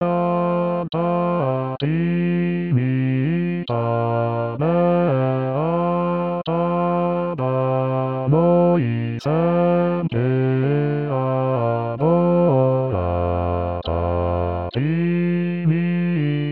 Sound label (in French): basse; baroque